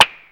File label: NOISE BLOCK, synth perc; Zero-G; Ambient 1